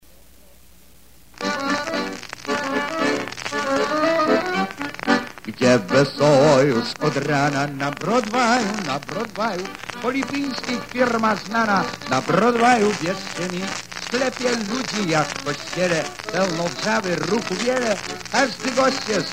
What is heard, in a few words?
Jingle; classic Kolipinski jingle